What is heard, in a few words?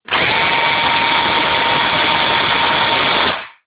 drill, industrial